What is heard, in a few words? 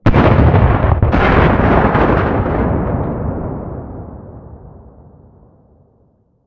explosions helicopter; STALKER; IWP